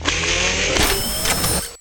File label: Menu Scifi Interface; Menu FX